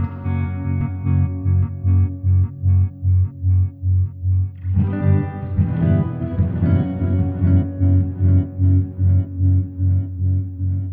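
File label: Rhythm Guitars, F#; Zero-G Kocktail Kollection; Construction Kitz (Lounge); Volcano at Sunrise 074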